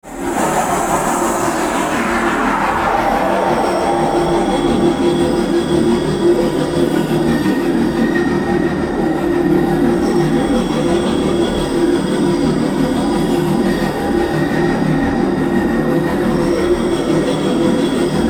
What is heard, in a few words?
Walk Crowded Streets, Drones; RoosterFX